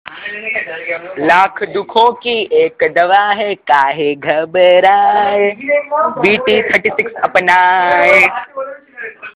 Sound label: Rap